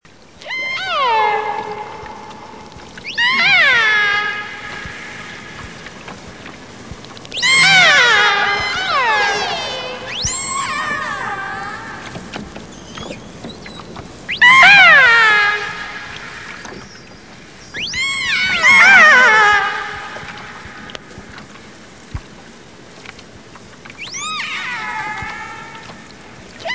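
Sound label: transient dialect orca species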